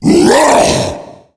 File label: tank attack, zombie vo; Left 4 Fortress